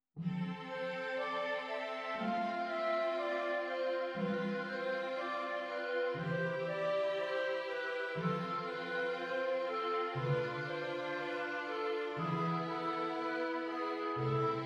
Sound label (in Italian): esercizi di contrappunto semplice A quattro voci; Ronge